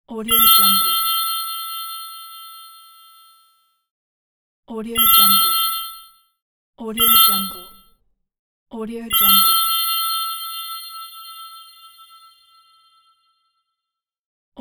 ding

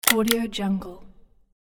stapler; studio420hz

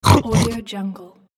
male grunt

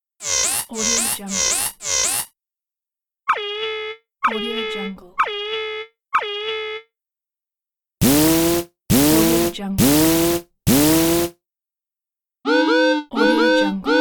alarm warning in game

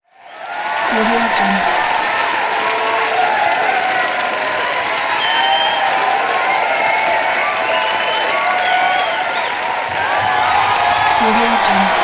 crowd cheering and applauding

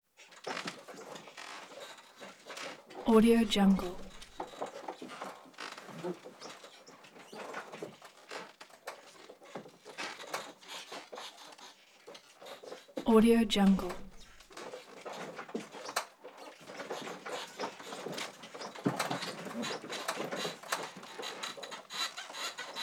bed movement squeaks cracks light knocking sex

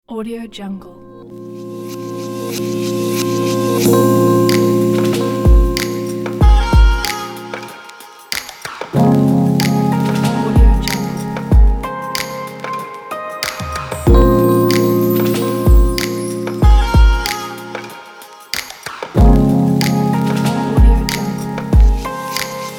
sexy

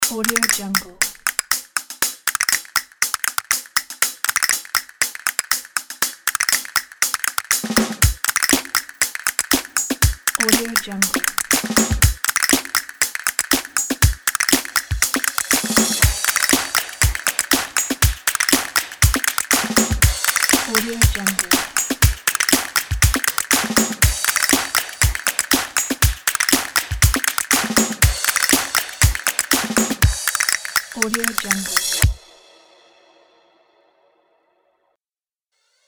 upbeat happy claps and castanets

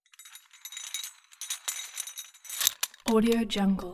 pitched just chains tighten whip swing; soundbits-soundfx

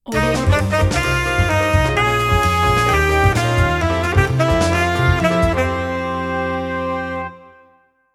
jazz samba logo

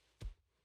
dragon footsteps

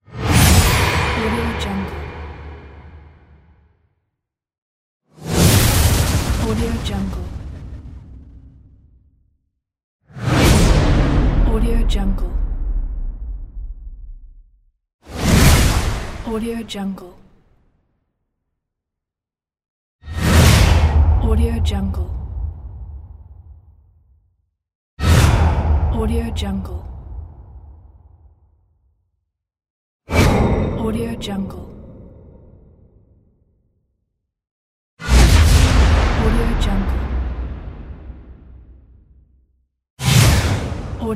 cinematic epic whooshes

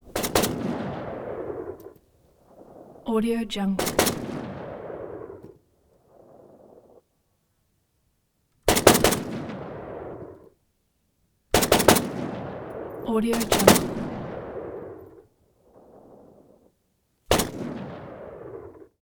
ak47 multiple single shots long decay; prosoundfx

